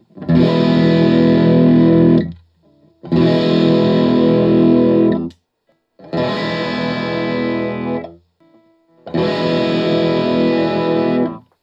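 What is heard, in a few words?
Guild M80 CS JCM800 Open E, guitar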